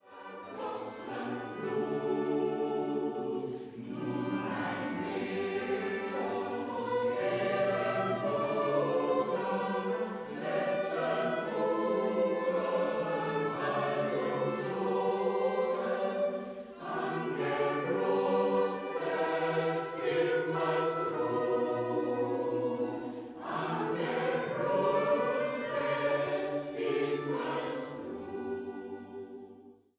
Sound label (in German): Chor Siebenbuergen Lied; Petersberger